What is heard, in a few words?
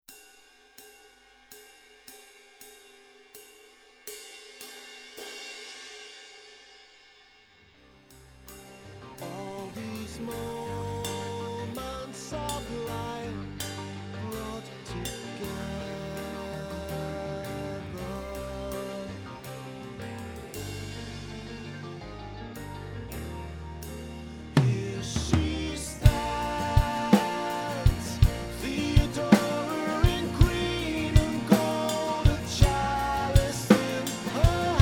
ride cymbal; S12112XEB